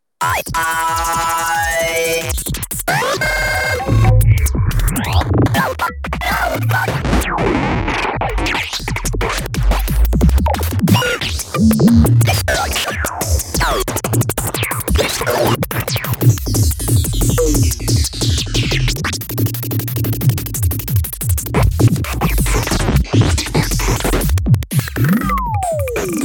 DrumKit, Deeply Disturbing Drum Kit

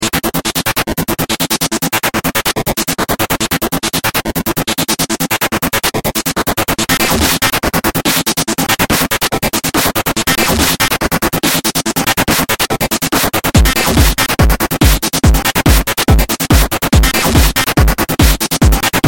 Synth Glitch Harvester; Metalthrix; QiTaNo

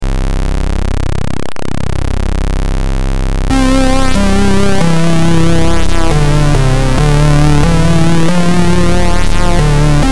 Synth PwmSquare

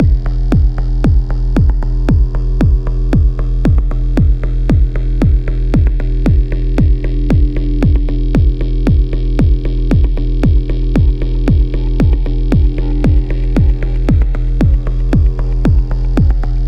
Square Bass

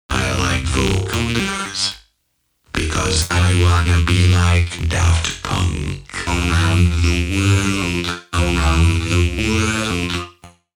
Voco Voodoo

